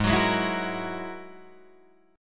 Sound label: G sharp 7th Suspended 2nd Sharp 5th, Guitar, Drop D; down; chord shape